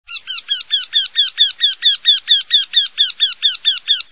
wendehals, singvoegel

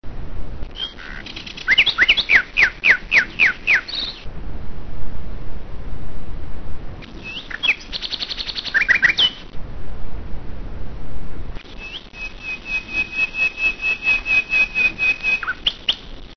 nachtigall; singvoegel

